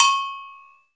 MINIC, Roland; Club-50 - Foundations Roland; CowBel+Metl

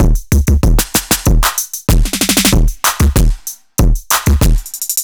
Electro Drums 95; musicradar

